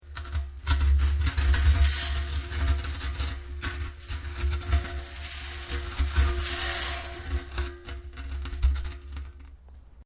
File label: POT CLAY LARGE ROTATING; Material components for constructing more complex sounds; Machinery Sound Effects